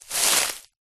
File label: footstep single grass thick dry; foley; zapsplat